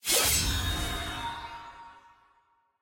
sfx mini wings diamond; challenges

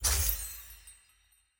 ui shop button hover

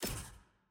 room select room click

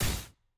medium; samira

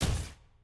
aah; jhin

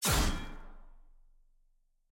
aah; viego

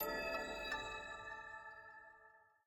go button click

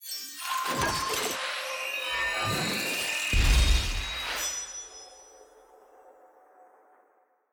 capsule upgrade; clash; lol

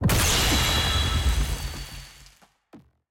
skill hit; skill 2; lux

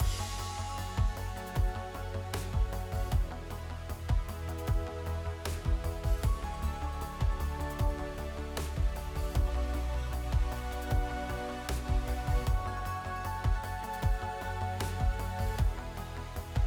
music blind pick; starguardian

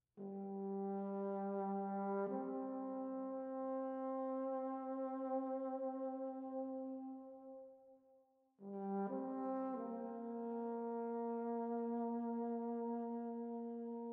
ambient music

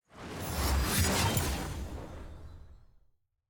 draft ban ring intro; champ select

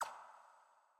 ui dialogue next

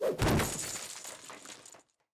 ceremony tableflip; tft; asol

